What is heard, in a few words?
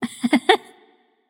heh heh light; smite; voicepack; hel